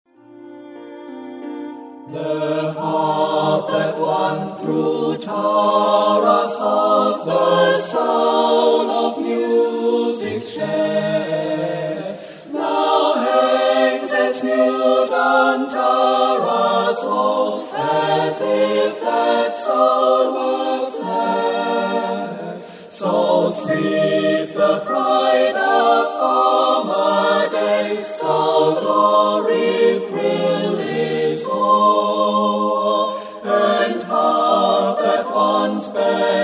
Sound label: Celtic Music The Harp